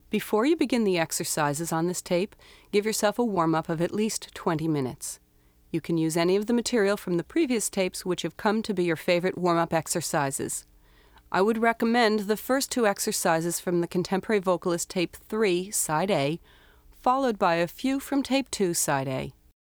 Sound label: Vocal Warm up